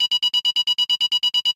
Sound Safe Failed; Peace, Death

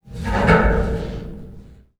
MOVEMENT Metal Tank; don t be afraid the first toy

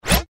SFX Swipe

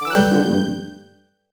Success; Snap Game

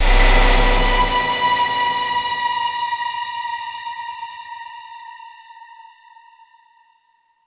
Sting; Stronghold

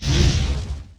Flame; Comb

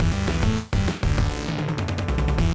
Drums End; Bally; pinball

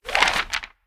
Ticket Move; Angry Birds

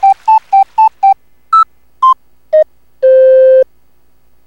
Default Town Tune; animal crossing full gamerip

